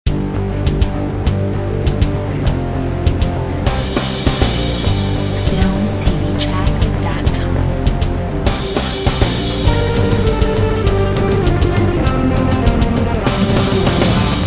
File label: Rock